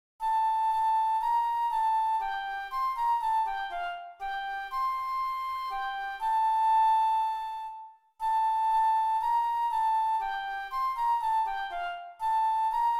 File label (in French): FLUTE